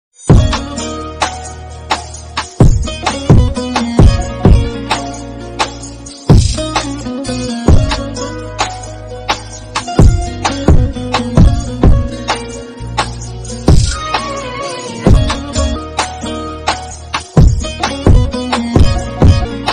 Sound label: Sad Music Remix